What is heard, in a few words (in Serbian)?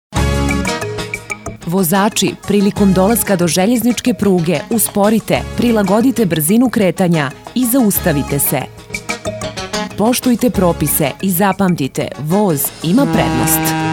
Radio džing